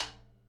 Rim Tom

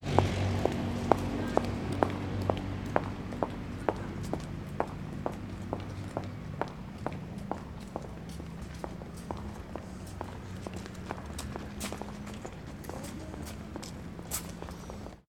Footsteps On Heels On Pavement

